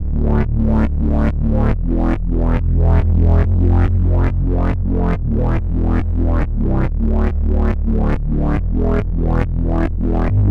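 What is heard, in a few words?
bass buzzy night lead; rob papen subboombass testbericht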